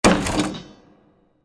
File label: Massive Impact With Large Reverb; Bursts; Impacts; Crafted Signals